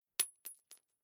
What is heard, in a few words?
Dropping Nut And Bolt On Concrete Bounces Metal Rattle Ring Single